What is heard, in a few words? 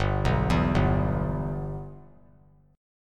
G 6th; G6 arpeggiated